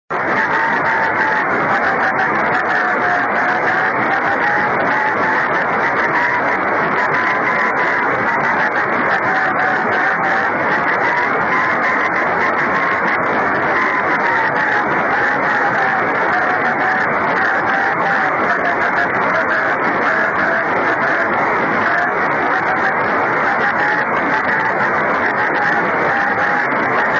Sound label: 24G beacon; 雨、気温１７℃ 湿度８０％; JH1UGF→ＪＲ川崎駅 JN1AYV←ＪＲ川崎駅水平ビーム RS52&レインスキャッタ; JH1UGF 横浜市; JN1AYV 茅ヶ崎市